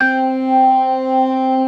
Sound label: ORGAN SYNTH; InVision Interactive; Keith Emerson